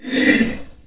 battlemage attack; megaglest

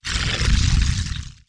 dragon attack; animals; dragons

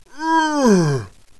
warrior die; megaglest

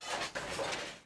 machine walk; megaglest

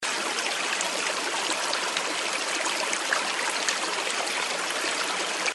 stream loop; varia cc0

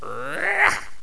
carpet attack; megaglest

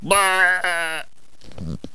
sheep die; megaglest

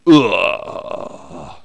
daemon die; megaglest

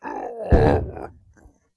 behemoth die; megaglest

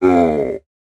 giant, animals

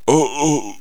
die; megaglest

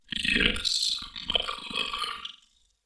ghost armor; megaglest

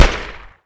gunshot; varia cc0

